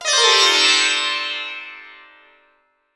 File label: Sitar Gliss; Roland BK-5; Loops & Phrases